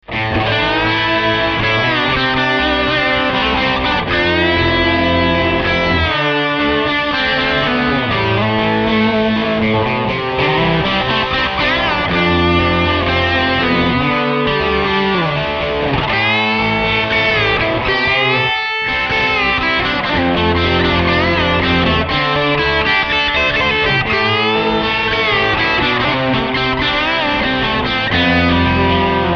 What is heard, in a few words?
lot of twang, guitars